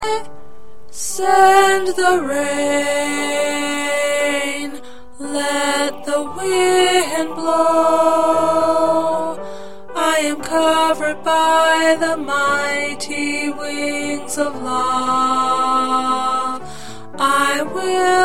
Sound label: Alto, choir parts